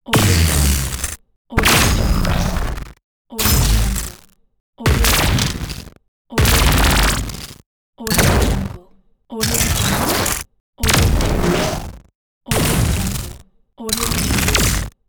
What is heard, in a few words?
transforming glitch; umcsound